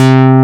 DANCE B C3; SAMPLE WAVE MIXING 1

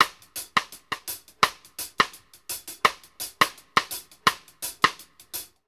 SIMPLE, KD REGGAE 84; Sampleheads; New York City Drumworks VOL-1

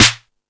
All Snares, E-MU Pro-Cussion; drum machine

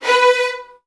VN 16TH, 1B2; ILIO; Kirk Hunter

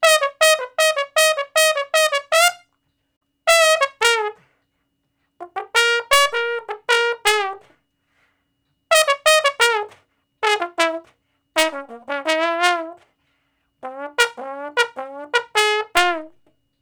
Trombone Shuffle (Bb); Sonic Foundry (Sony Creative Software); Horncraft for R&B; Solos

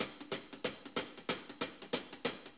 Shuffle HiHats; Sonic Foundry (Sony Creative Software) - Loops & Samples Drum Tools; Shuffles

